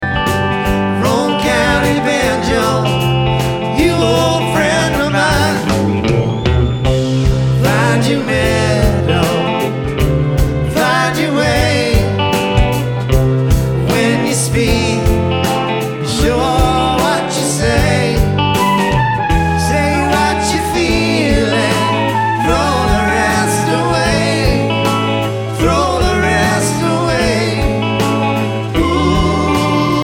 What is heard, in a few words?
Banjo